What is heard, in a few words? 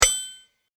ding